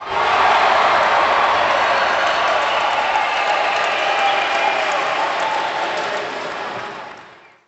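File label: Boxing Crowd Large Reaction; Crowds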